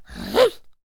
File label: Attack Vocals; Void Cultist